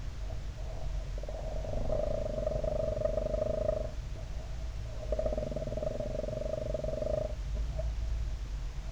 croak, elephant

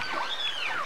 whis, beluga

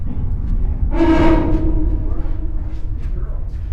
trumpet, elephant

